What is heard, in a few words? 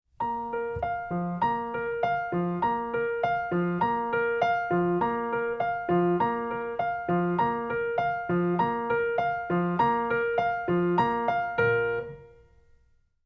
Little Bells Of Westminster